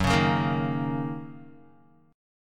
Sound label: Fsharp 6th down Guitar; F#6 strummed